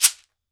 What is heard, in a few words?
SHEKERE, Drums&Percussion